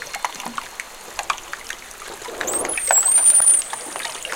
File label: Transient whistles